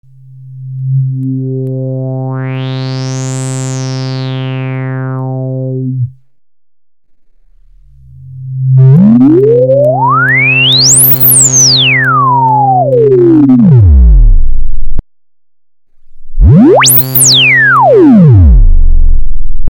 lpf reso, synthesizer

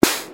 SD, vermona drm1

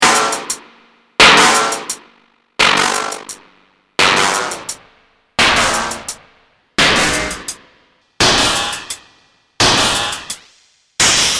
ring drum

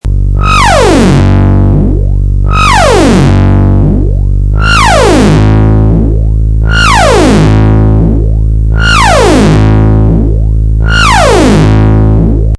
SYNC, Synthesizer; simplesynth; simplesizer5

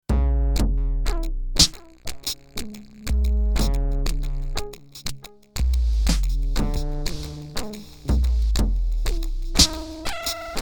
britzel pop, spectralis

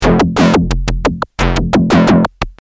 arp

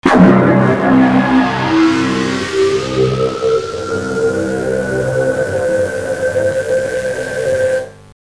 q bass, waldorf

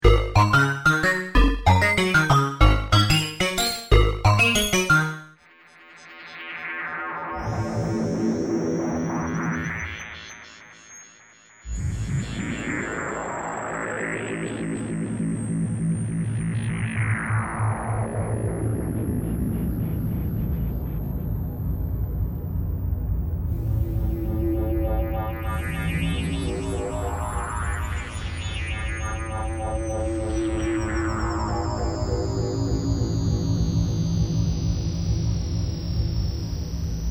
micro q, waldorf

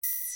hh, vermona drm1

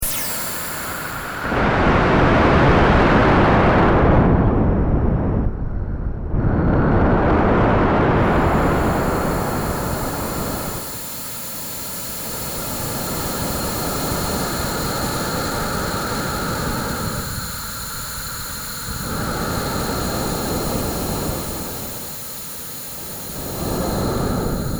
noise kuh, waldorf q plus

